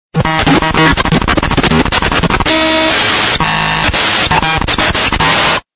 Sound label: glitch sound effect